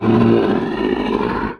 Seal Mutant Fire; Playable Tutorial Level; TR3

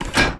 Trapdoor Open; Playable Tutorial Level

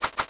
Swoosh Swirl Double; Playable Tutorial Level

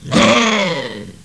Wolf Howl; Playable Tutorial Level; TR1

